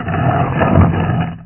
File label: Stone; Buggy; PS1